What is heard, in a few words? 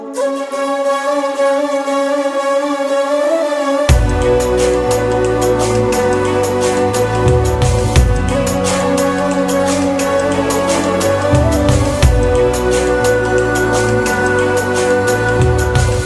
BGM Ringtone